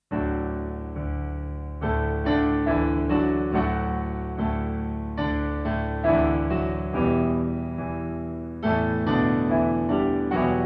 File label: piano